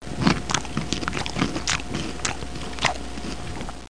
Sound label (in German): Schmatz; PARTY